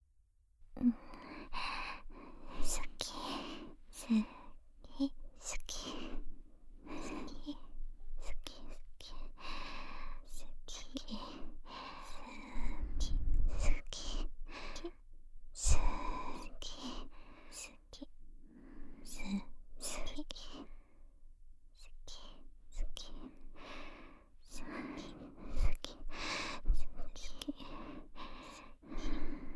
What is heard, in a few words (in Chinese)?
满满的喘息♪两侧耳边连呼喜欢循环音轨; 双耳边喘气双胞胎音声